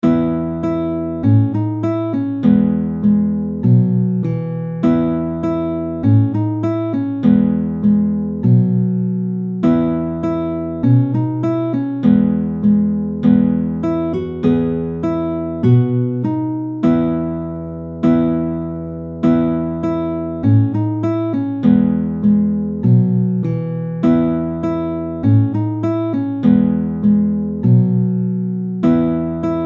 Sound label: Polka; John Ryans